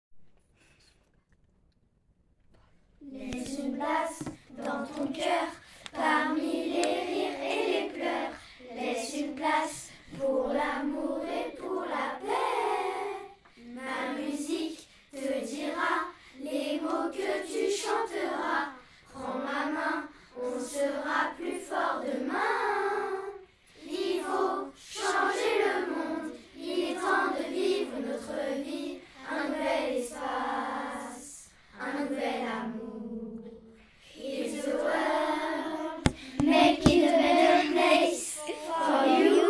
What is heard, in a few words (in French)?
chorale; Chant choral.